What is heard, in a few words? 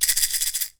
PEYOTE; AKAI S6000 CD-ROM - WorldSounds